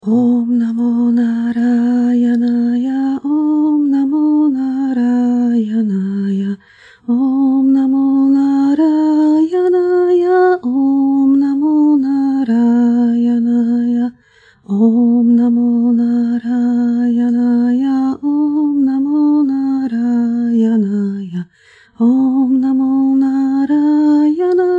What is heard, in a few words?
Om namo Narajana, mantry